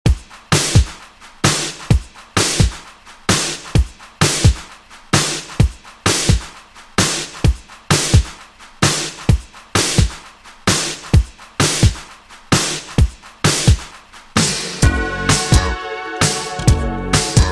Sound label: 80s Redrum 130Bpm